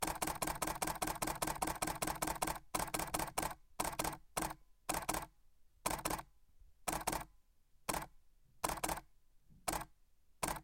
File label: Canon EOS 5Ds Burst